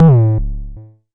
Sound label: Jomox MBase, drum machine; Jomox MBase 01